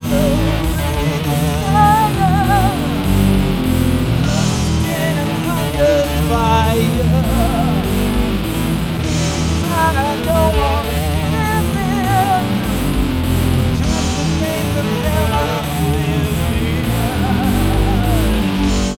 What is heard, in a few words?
harmonic, Phase based harmonic percussive separation; reference algorithm